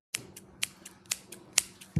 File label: scissors